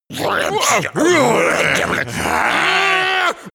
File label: excited trolls